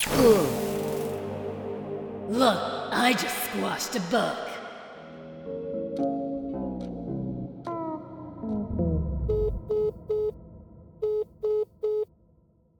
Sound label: death state; Pitch Black - A Detective's Demise